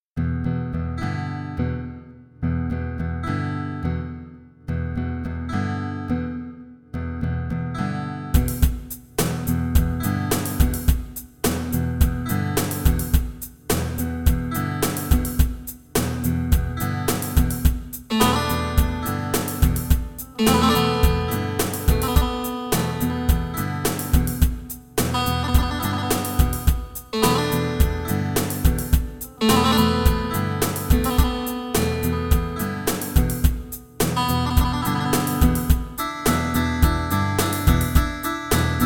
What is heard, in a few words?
open guitar